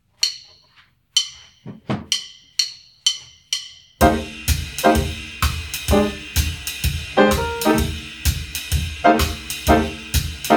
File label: F Blues NO BASS for bass players; 2026 Jazz High School Honor Band at Colorado State University